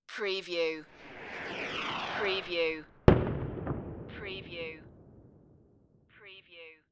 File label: rocket hiss and explosion